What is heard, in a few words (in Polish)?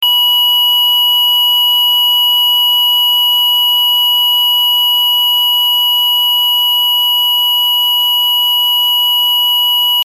ton